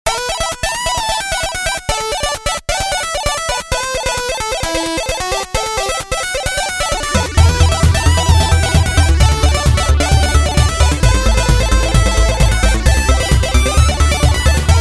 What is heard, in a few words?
arpeggio; phandral; arpeggio domination